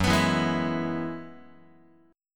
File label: F sharp 7th Suspended 2nd Suspended 4th, down, Guitar; x,2,2,2,0,3; 4A,4E,5A,5D,5Gsharp,6Csharp; strummed